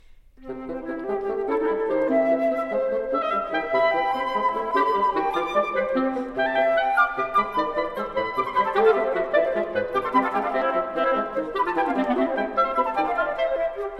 Quartet In C For Woodwinds - Mvt. 3. Allegro Vivace E Leggermente